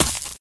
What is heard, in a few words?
gravel footsteps, infected walk; player; source engine; left 4 dead 2